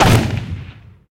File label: tower attack